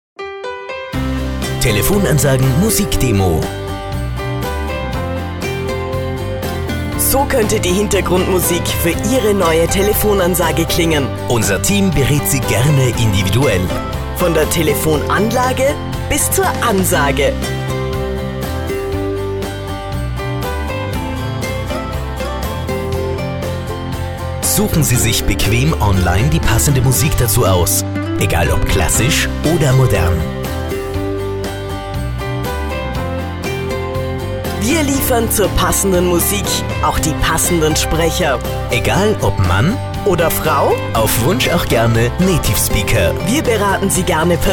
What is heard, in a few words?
Popular Rock